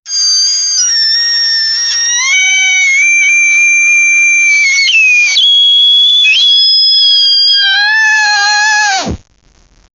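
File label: lively pub ambience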